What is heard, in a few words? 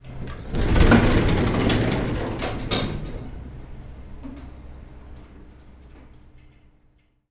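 elevator open; 1000fx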